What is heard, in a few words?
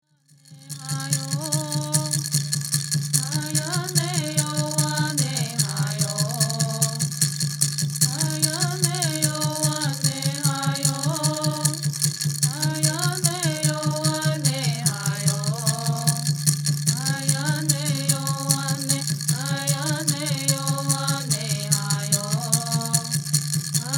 Native American Church Song